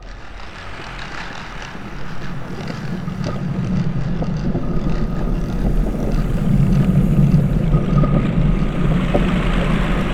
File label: Cavernscape, Ethereal Textures; Sonic Foundry (Sony Creative Software); Ma Ja Le; Soundworlds